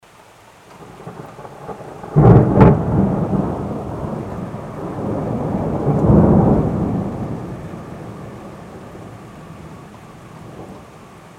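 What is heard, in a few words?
sound of thunder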